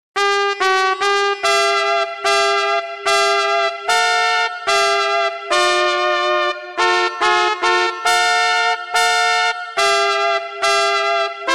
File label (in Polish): trąbka